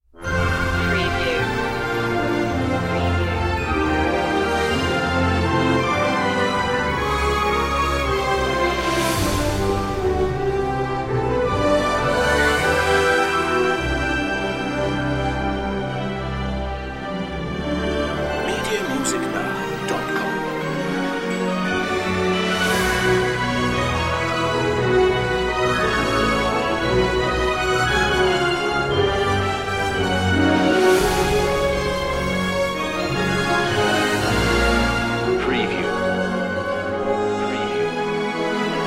theme no choir

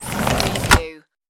sliding window

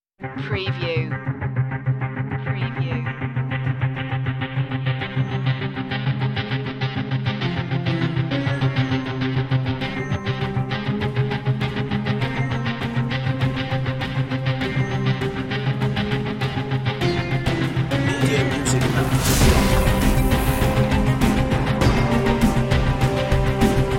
eastern tension

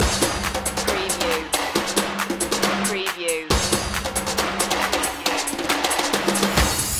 samba party loop

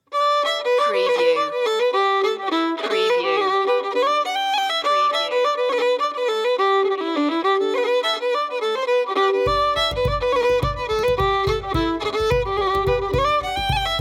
flute fiddle

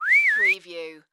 Whistle Male; Sound Effects Bible

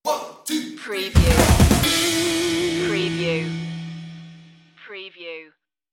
dorm room stinger

